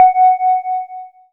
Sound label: SYN WET FEE, Microwave; Sound & Vision - Gigapack I CD 2